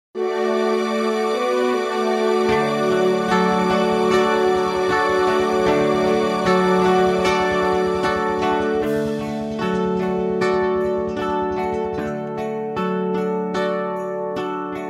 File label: No Backing Vocals